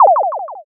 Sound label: poyon